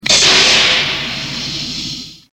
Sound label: airlock